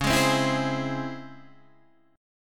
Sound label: Db Minor Major 7th, Guitar, Open D